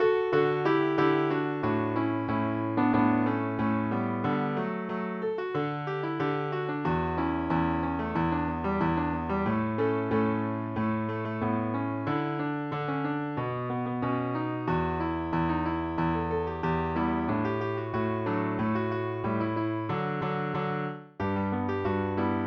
guitar petite polka

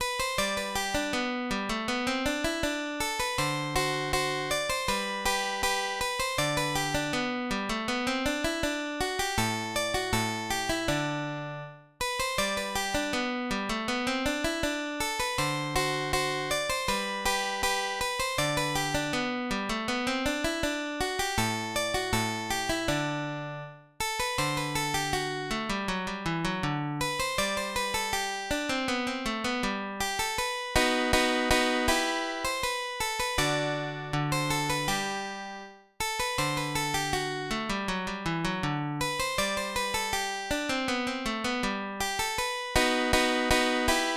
allegretto, guitar; settima posizione

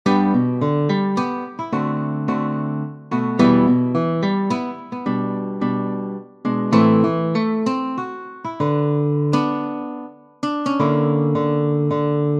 mazurka

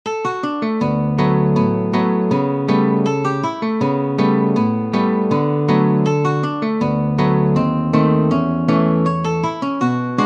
Polka, guitar; francisco tarrega

